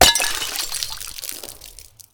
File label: Friendly Bartender Death